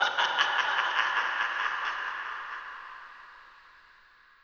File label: laugh